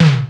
SIMM SNR, SIMMONS; Hollywood Edge; The Big Bad Beatmachine

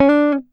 GTR TRIK, Jazzistic; Univers Sons

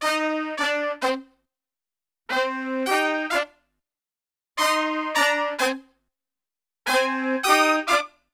POP CM-BB, Brass; Best Service; Brass Super Section; POP LICK

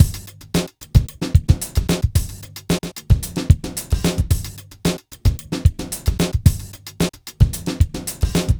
111, Beat Loops; Zero-G - Monster Beats

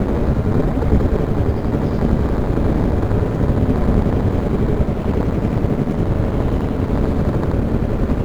Drone Ambient; Drums & Drones

